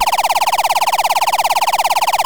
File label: laser